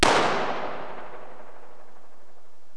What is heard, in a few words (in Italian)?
palco, Teatro dell'Aquila